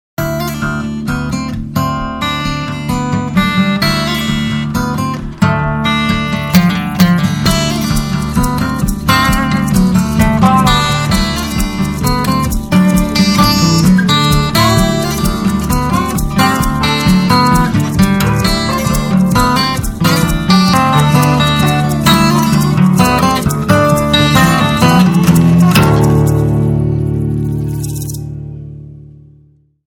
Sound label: DADGAD BLUES